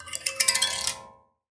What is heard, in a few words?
Finger Gesture, Finger Prepared Kit; Battery 3 Sample Kits